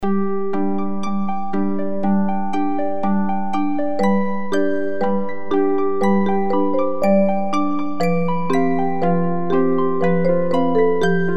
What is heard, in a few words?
Winding Down Looping